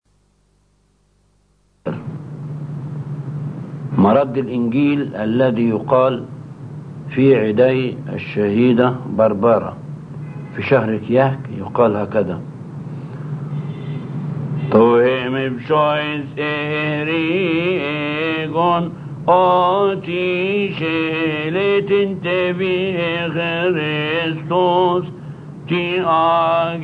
Gospel Response; Alhan Shohada; Martyr Barbara

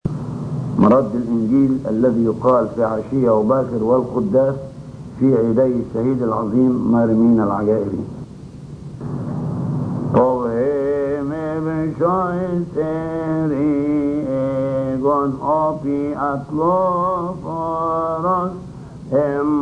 Gospel Response; Alhan Shohada; Martyr Mina

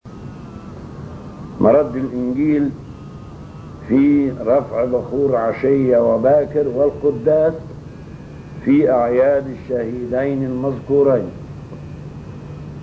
Gospel Response; Alhan Shohada; Martyrs Sergius w Bacchus

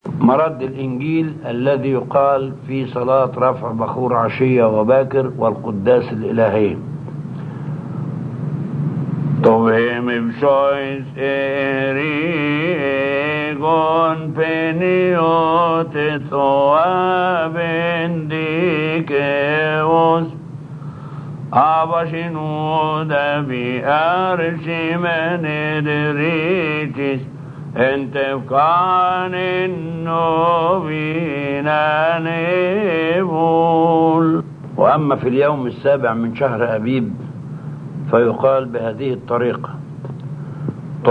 Gospel Response; Alhan Kedeseen; Saint Shenouda

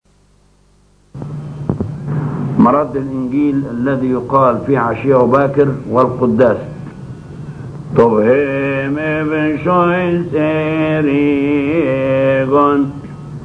Gospel Response; Alhan Shohada; Martyr Marina

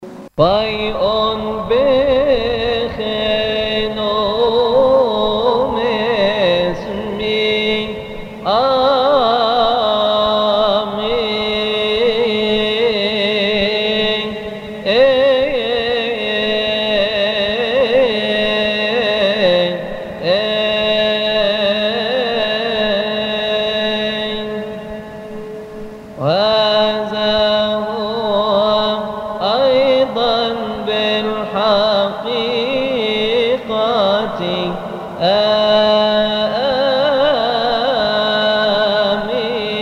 Fai On Pe Coptic and Arabic; kodas; khoras

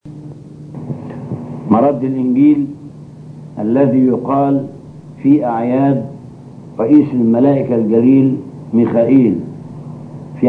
Gospel Response; Alhan Maleyka; Angel Michael

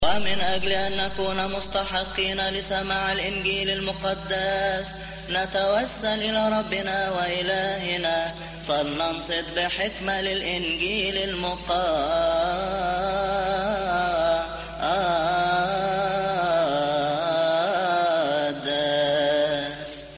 Ke Eiperto Arabic; holy week; Der ElAnba Makar

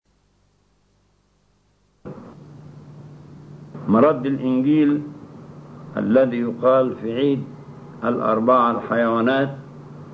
Gospel Response; Alhan Maleyka; 4 animals

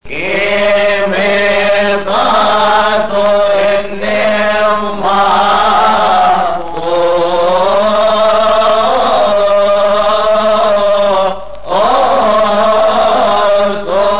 Ke Metato Ebnevmato, Chorus; kodas; khoras; OKipios responses; Maahad Faraony

